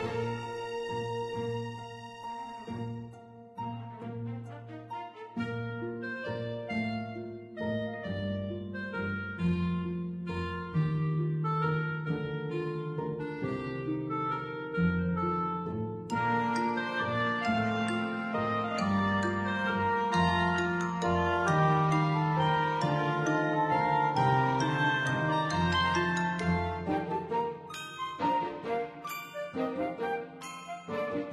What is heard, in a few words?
In Game Loop; Alphabetty Saga; Android